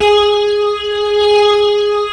G#, The Piano; InVision Interactive

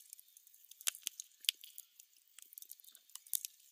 Campfire; outdoor; Camping

